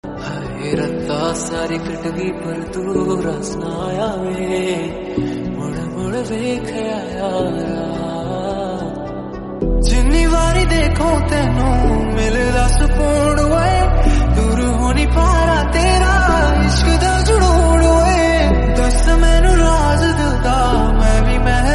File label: punjabi love emotional